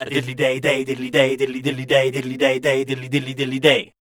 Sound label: SCAT C